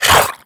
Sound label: grunt, headcrab, creatures; headcrab classic; vox